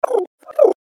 creature coo funny

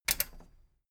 metal button stereo click enable heavy

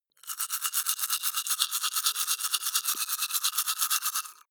teeth brush dry open molars

